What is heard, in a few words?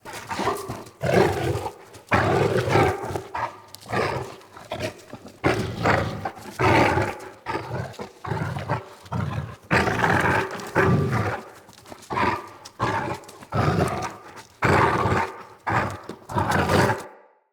Battle Dog Attack Breathe Snarl Run Loop, Animals; Vocals; Snc Battle Dog